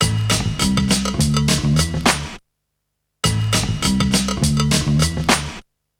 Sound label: Drum Loop